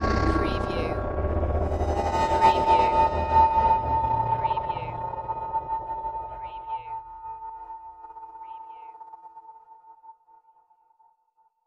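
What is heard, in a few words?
Production Element Chopper; Sound Effects Bible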